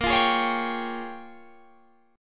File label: A sharp Minor 13th A sharp, Guitar chord